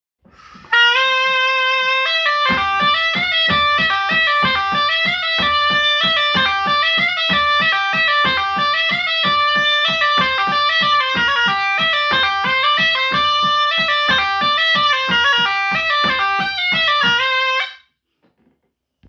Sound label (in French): Bourree du Gevaudan; Bourrees